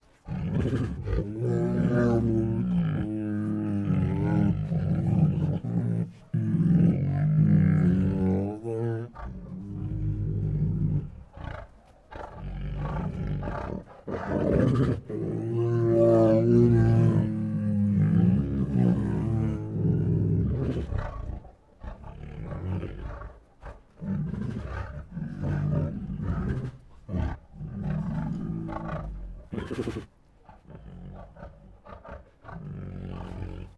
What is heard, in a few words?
Two Tigers Make Deep Pants and Moans; Sound Ideas